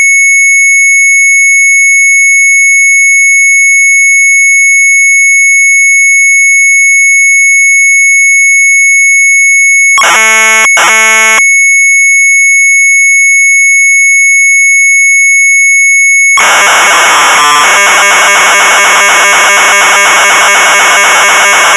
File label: Sharp MZ800 Tape